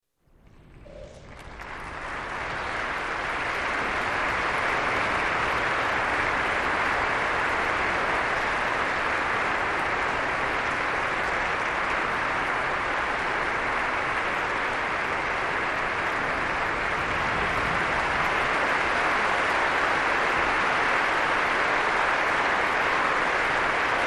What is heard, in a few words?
Applause